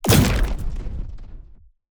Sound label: Sci-Fi Grenade Explosion